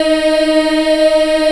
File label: D#3 child EH L, Choir; USB Soundscan vol.28